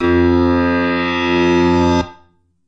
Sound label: Piano F2, backwards piano